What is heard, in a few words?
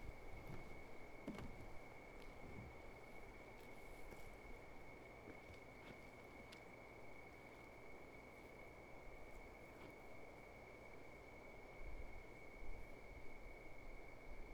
zoom h2 crickets; ambisonic